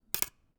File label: Part Assembly; TOOLKIT vol.2